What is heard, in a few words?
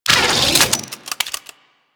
Grenade Launcher Reload; Warframe; Weapons; Corpus